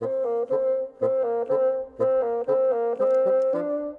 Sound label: Bassoons, Orchestral; Sonic Foundry (Sony Creative Software); Orchestral VOL-2; Modern 05